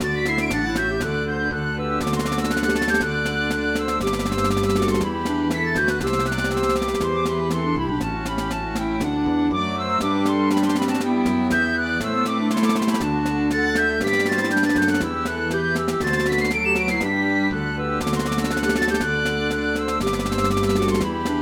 Bush Dance; MTWFC VOL1 Audio Accompaniments

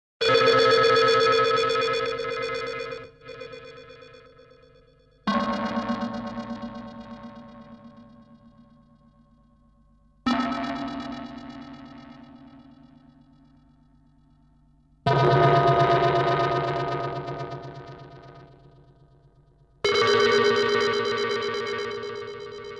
Backing track

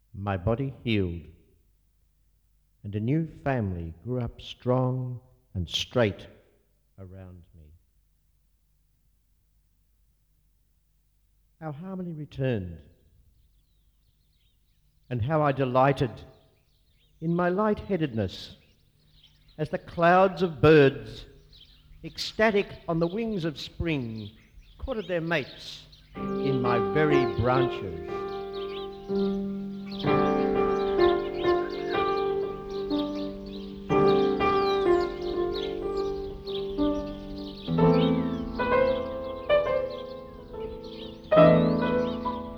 Backing track

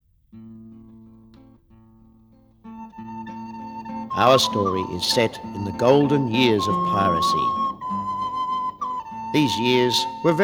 Backing track

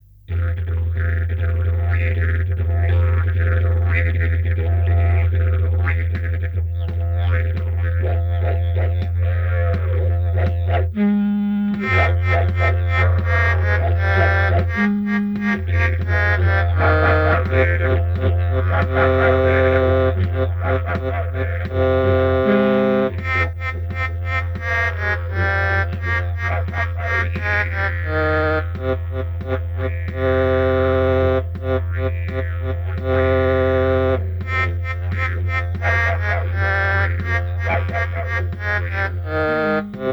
Backing track

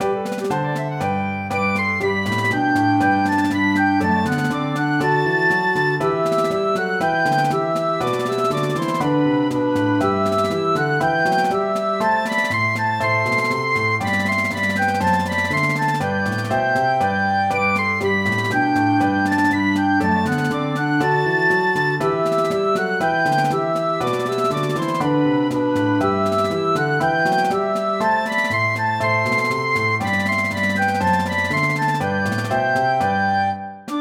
Quadrille; MTWFC VOL1 Audio Accompaniments

